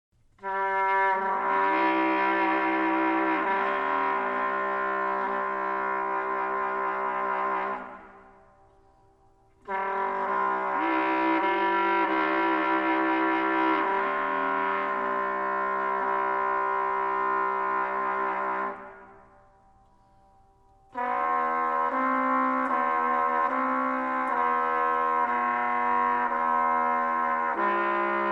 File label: Fantasy for Trumpet and Piano